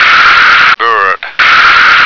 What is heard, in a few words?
bird